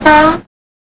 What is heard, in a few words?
klaxon, Train; OpenBVE; 55022 Royal Scots Grey